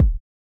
Not Nice Kicks

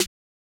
Open Snares

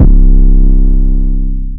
Drill 808; Sample God

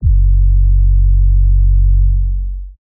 Astro Screw 808 - F#; Cymatics; 808s

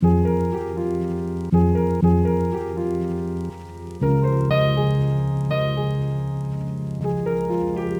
Loop

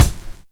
Kicks

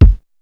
kick, Kicks

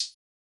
Hi Hat

